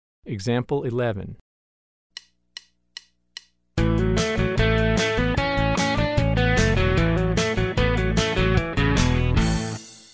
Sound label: Country Rock